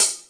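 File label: Yamaha RS7000 hihat cymbal, Drum machines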